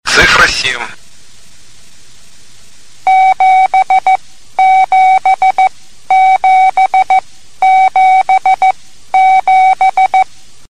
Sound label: CW